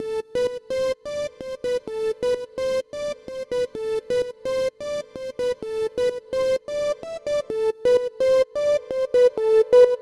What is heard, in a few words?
synth melody filter, Key A Bpm 128; Big Room House; Loop Nerds; Big Room House Allstarz